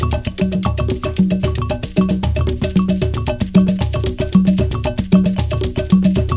DRUM CAB BELL; Zero G - Ethnic

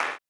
CLAP, blues & soul; ILIO; Vocal Planet

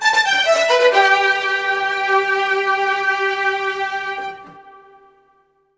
STRG; USB Soundscan vol.32; Roots Disco; AKAI

